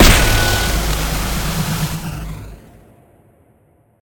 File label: Punch